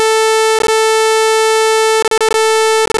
sine; invasion

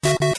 tune

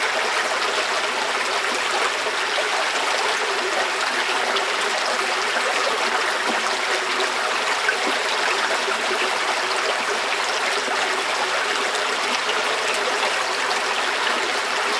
waterfall

